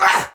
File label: Attack Effort; Warframe; Tau; Dax; Ashigaru